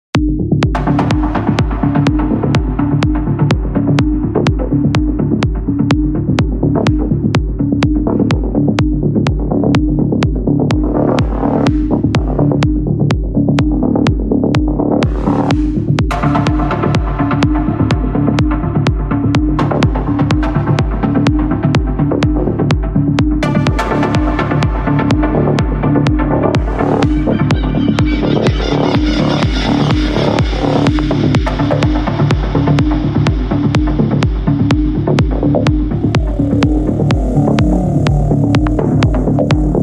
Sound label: Mashup